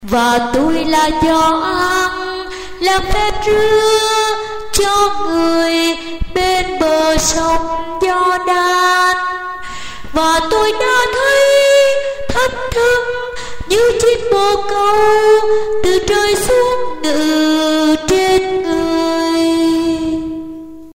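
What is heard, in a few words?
soprano tenor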